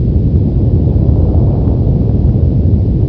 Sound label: stomp; Battle